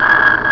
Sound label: ASDIC, ALARMS